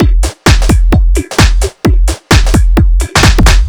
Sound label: 130 No Hats, Drum Loops 130bpm; Zero-G; Soundclash Nu Dance Electro; Acidized Wavs